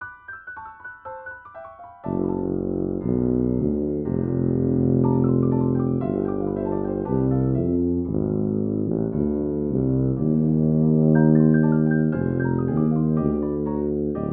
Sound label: Dirge, tuba